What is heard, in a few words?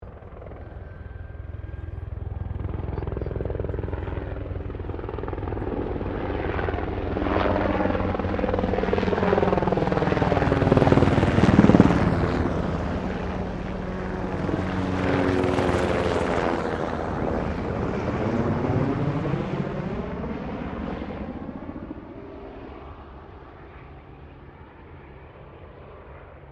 HELICOPTER SIKORSKY UH60 BLACKHAWK X2 PASS, Australian Army Blackhawk; Helicopters (Sound Effects:Vehicles)